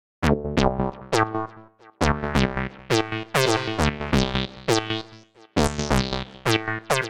D Minor 135 bpm, Bassline; MIDI Clips; Tonal; Skitter and Step; Ableton Factory Packs

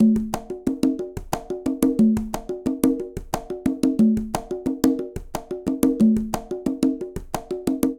Fusion 6 8 Quinto Conga Tumba 120bpm; Latin Percussion; Ableton; Factory Packs

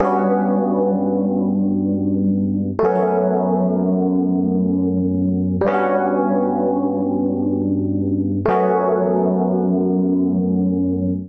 Piano Chords B Minor 85 bpm; MIDI Clips; Music; Accompaniment; Ableton; Chop and Swing

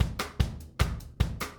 150 Ska Drums; Pop Rock

